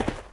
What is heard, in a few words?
gravel